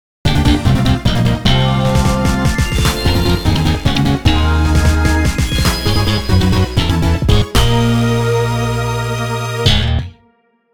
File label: Bass Guitar Obtained; Cadence of Hyrule